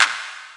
modern hip hop hand clap sound f sharp key, Royality free clap sample tuned to the F# note.